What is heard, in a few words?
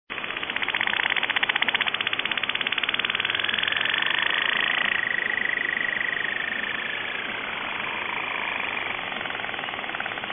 minke whale